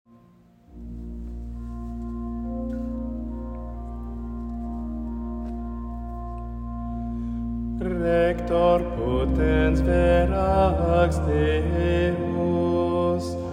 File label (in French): sexte fetes impair ordinaire latin; heure mediane impaire ordinaire